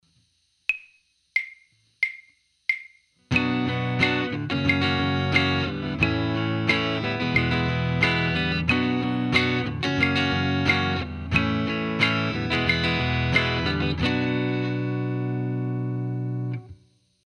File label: practice chord